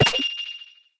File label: Buy